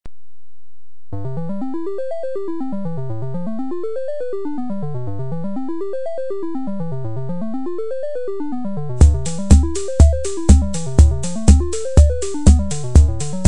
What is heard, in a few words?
kb500 disco